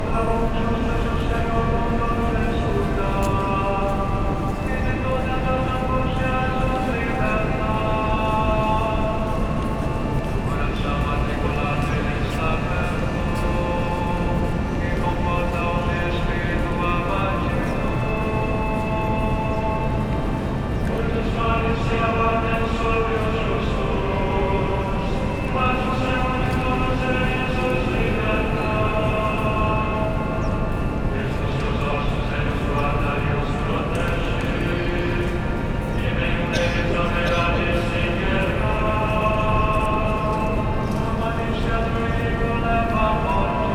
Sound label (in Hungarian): katedralis hangfalbol zene